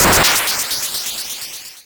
exp cluster, arcade game sounds, Explosions

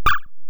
sounds button, arcade game sounds

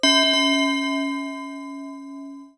Tubular Bell

